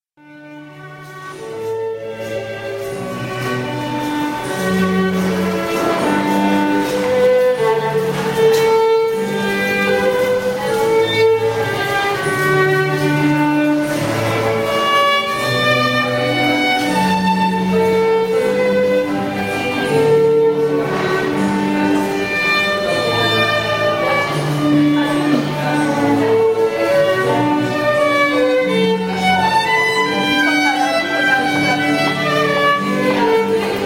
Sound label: violinist